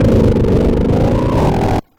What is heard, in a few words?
Static; Blastwave